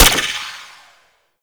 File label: Mech; Void Trader Gorgon